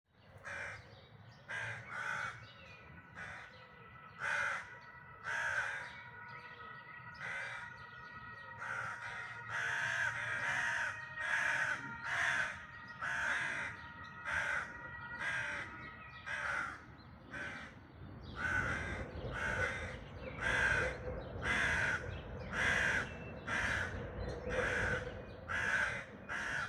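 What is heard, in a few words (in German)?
Dawn Chorus, Vogelgesänge vor Sonnenaufgang; der dawn chorus 2026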